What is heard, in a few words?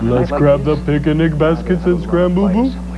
boo boo